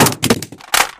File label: pda soldier drop; video games; player; source engine; tf